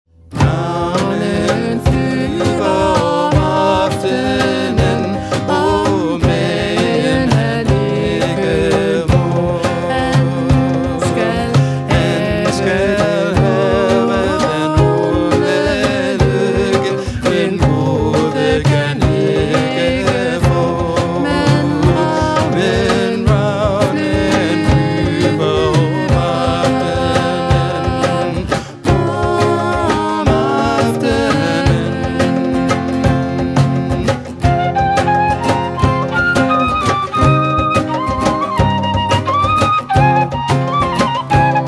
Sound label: danish trio